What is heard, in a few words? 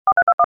DTMF RX C; Zello Android Gateway